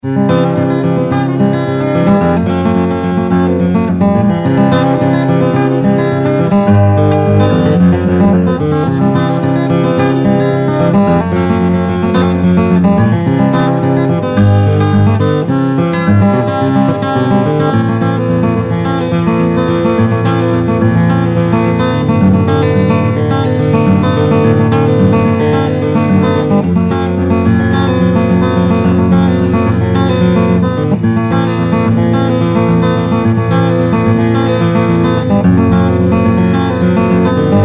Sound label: Fingerpicker